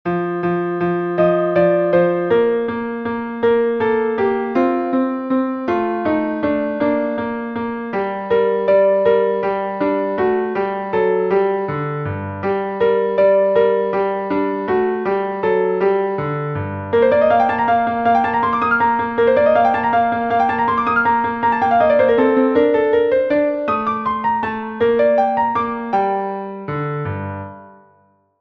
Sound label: Piano score